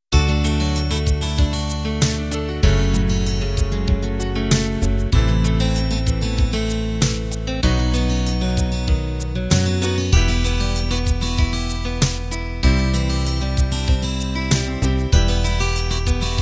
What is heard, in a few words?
folk ballad